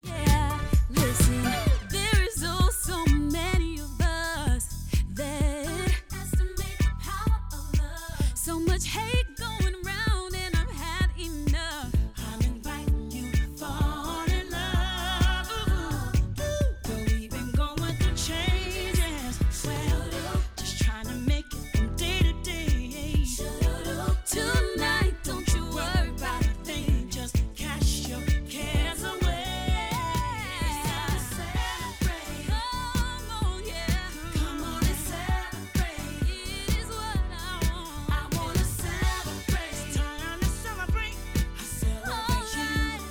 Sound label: Music; Star Studded Saturdays; Sparkle Wrap